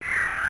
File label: Metroid Hit; Metroid (USA)